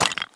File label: run tile; Escape from Tarkov